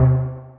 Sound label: Bass, One Shots; Touch Loops; BlissedOutBeats